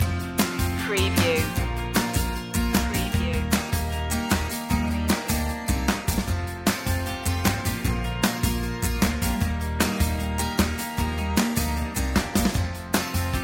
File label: springing indie loop